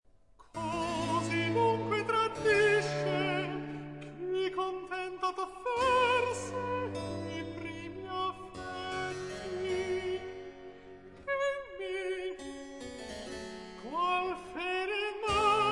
Recitativo, Cantata for Alto solo; Antonio Lucio Vivaldi; Perfidisimo cor!; RV 674

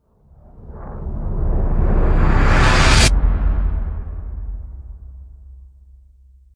Artisan Hit Swell; Ninja Tracks

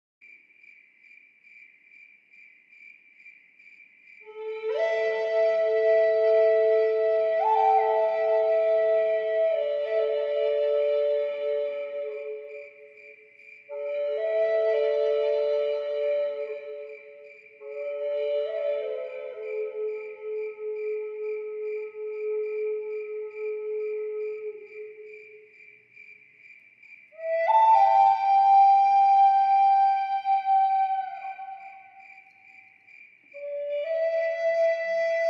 Flute & Nature Soundscape for Massage

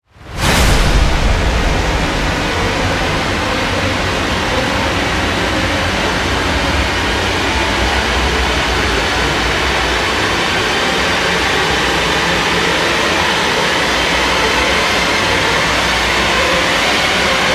Rise; Ninja Tracks

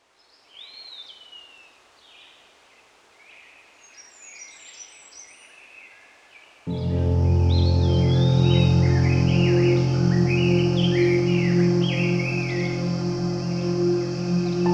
Relaxation Meditation New Age Piano Music

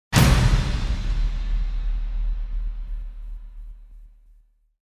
Warfare Hit; Gothic Storm Music

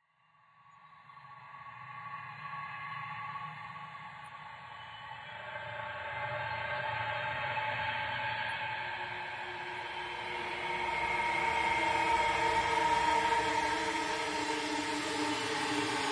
Drones Shiver; Gothic Storm Music; Advanced Sound Design 2012

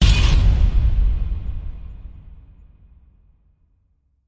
Hit Big Reload; Brand X Music; Brand X Music Catalogue - Editor's Essentials

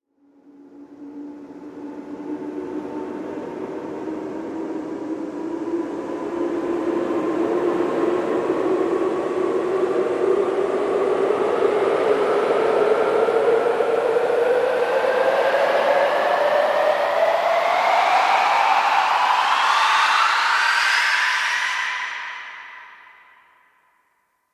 Rise; Ninja Tracks

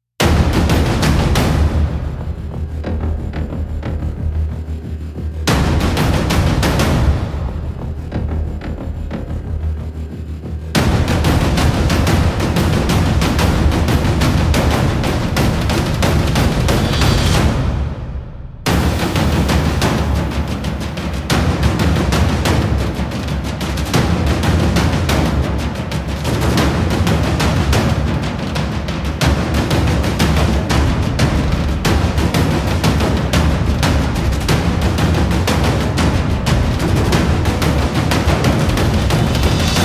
Epic Speed Percussion; Gothic Storm Music

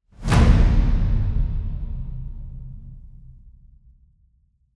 Horsemen Whoosh; Gothic Storm Music

